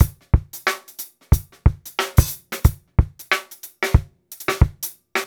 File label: 091 HipHop Live Drums; Digidesign; Pro Tools; Pro Tools Essential Loops